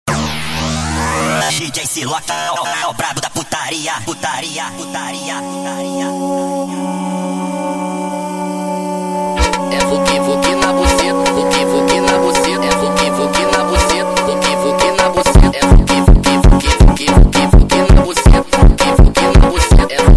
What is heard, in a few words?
Alien Horror Funk sped Up